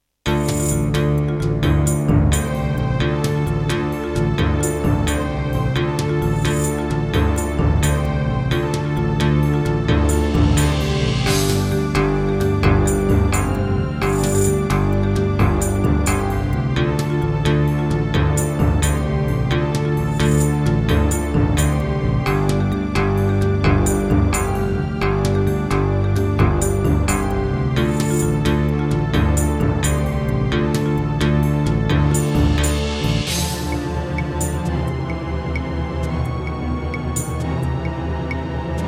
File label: Yamaha MU2000EX GS MODE, wavetable; Heretic